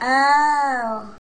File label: "oh", baby; ooh ahh